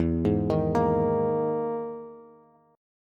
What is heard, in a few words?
E-Major Sharp 11th E, Bass Standard; EM#11 arpeggiated; 12,11,13,15; JGuitar